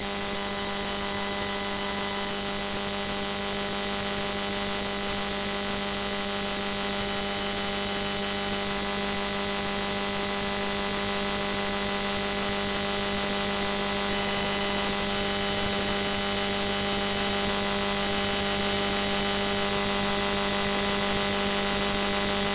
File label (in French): telephonie 4G LTE liaison descendante